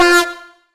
acid synth (F); Sonic Foundry (Sony Creative Software); Ugly Remnants VOL-2

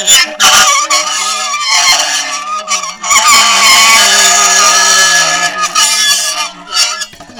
ronk, science fiction; E-MU Producer Series Vol. 3 – Hollywood Sound Effects

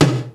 GATED TOM HI, MPC-60 DRUMS; Greytsounds VOL-1

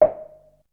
Perc; ZERO G - Datafile DISC 3

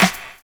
DISCO TRI; Univers Sons

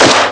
gunshot; Amiga Soundtracker Sample Packs

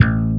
slap bass A0; E-MU Formula 4000 Series Vol. 5; Protozoa; Proteus1 Presets

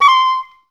OBS C5, Advanced Orchestra; Best Service

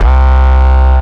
C Bass

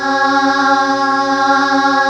chor aaahh; Amiga Soundtracker Sample Packs

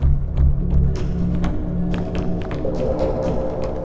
Pure Tabla, 125BPM; Zero-G

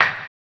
HAMBONE; Blues & Soul

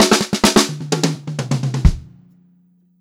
128 funky fill, funk groove drumloops; USB Soundscan vol.38; AKAI

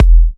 E kicks; markus hakala kicks

